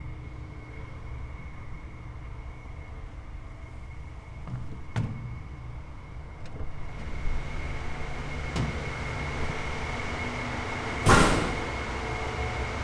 paranal vista tour, stereo; soundscape

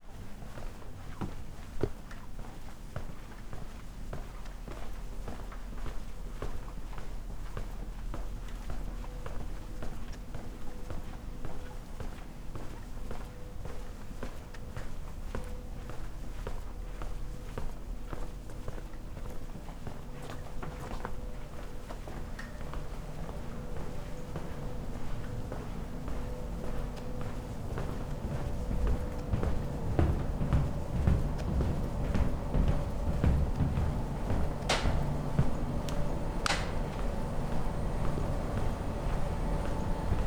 Soundscape: Paranal tour from Control Room to UT1

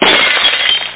software glass breaks; paranal

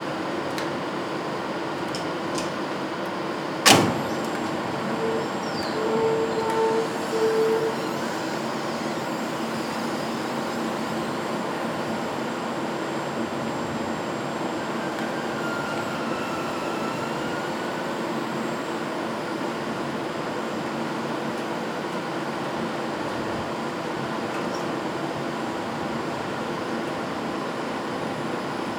La Silla NTT dome closing, soundscape; mono

